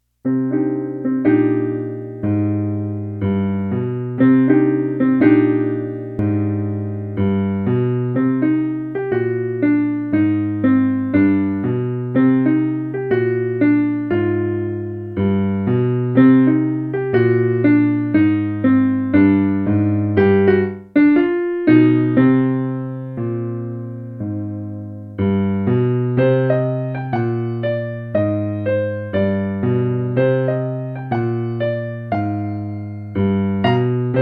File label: Cool Groove